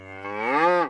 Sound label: moo question, cow; Cow Construction Kit